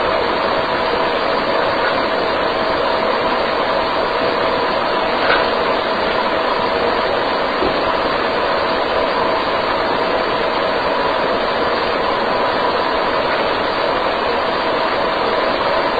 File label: Unexplained Footsteps sounds